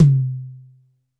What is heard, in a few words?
Jazz Tom 4, Yamaha PSR-E323, Jazz Kit